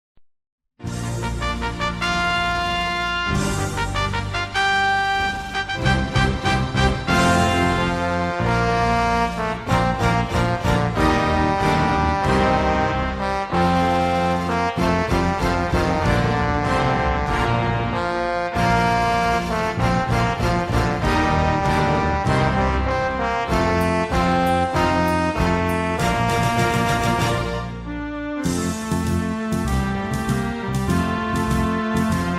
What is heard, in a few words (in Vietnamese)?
quoc te ca khong loi